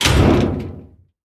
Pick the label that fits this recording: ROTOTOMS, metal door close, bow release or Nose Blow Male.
metal door close